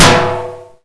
ric metal, weapons; valve

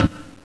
bounce, grenade; valve

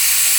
tv static loop; garrysmod

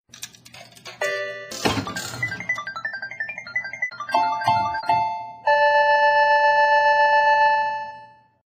slot machine; valve; dutch; studnight

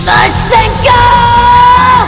power beam; valve; gohan

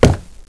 pl wood, player; valve

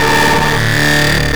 siren

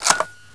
sniper bolt down; valve

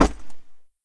g bounce; weapons; valve

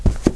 carpet; valve

